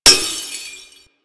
Glass Impact; Warframe

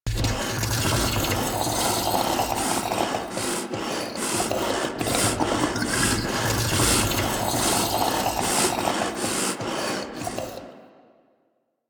Proto Infested Mutalist Speaker Attack Flesh; Warframe; Abilities

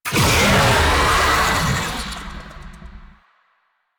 Infested Oni Vocal Player Spotted; Warframe

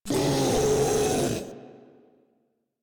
Proto Infested Ancient Vocal Attack; Enemies; Vocals; Warframe

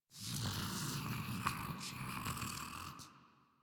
Breathing; en; Warframe; Grineer; Ghoul

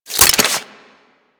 Whip Unequip; Grineer

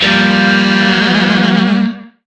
Shawzin Blues Chord; Warframe; Lizzie Shawzin

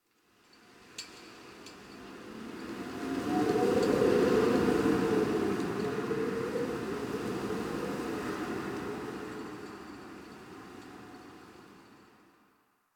Exterior Winter Wind One Off; Warframe; Ambience; Nineteen Ninety Nine; DE

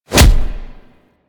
Rush Enemy Hit; Warframe; Runner

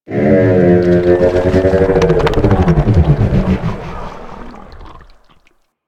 Mushroom Adult; ambience; props; one offs; Warframe; Venus Open World; Venus Landscape

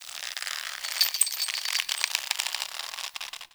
Chain Rope Basket Creak; Ambience; Dungeons; Under City

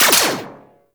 Rifle Mech; Grn Kuva Lich Rifle

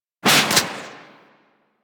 Crossbow Shot, weapons; Garuda

